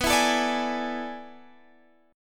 A Major 7th Suspended 2nd Suspended 4th

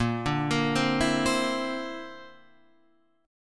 D 7th Suspended 2nd Sharp 5th A sharp

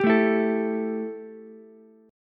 E Minor Double Flat 5th, Ukulele; 12,9,12,12; strummed; JGuitar

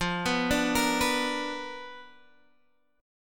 B Minor Major 7th Flat 5th, chord

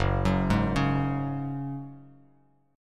Gb Suspended 2nd, Bass Standard; arpeggiated

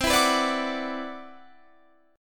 C Major Sharp 11th

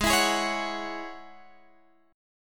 Ab 7th Suspended 4th Sharp 5th; 16,19,18,18,19,x; strummed

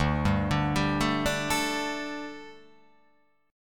D Suspended 4th D

